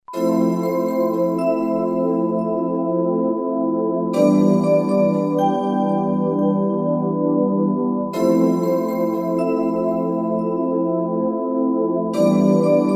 MUSIC BOX

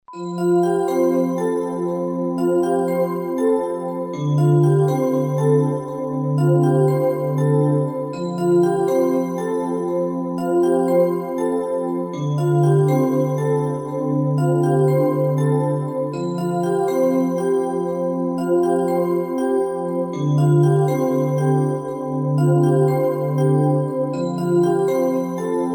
MUSIC BOX

